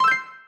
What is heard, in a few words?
correct chime